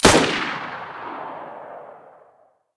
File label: mosin muzzle mono, weapons